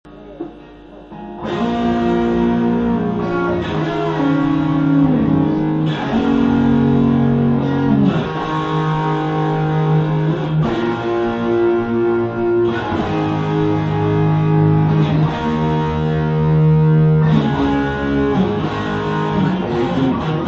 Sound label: jungle